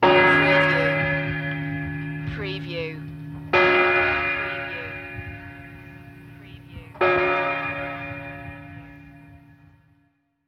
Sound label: Bells Church Antique